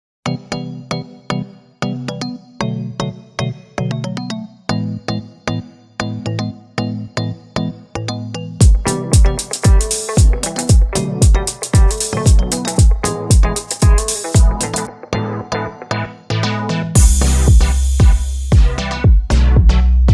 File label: Instrumental; BNA - Brand New Animal